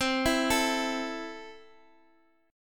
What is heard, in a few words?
A 5th C, guitar chord